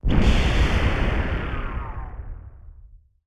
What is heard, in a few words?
Ship Explode; battle suit aces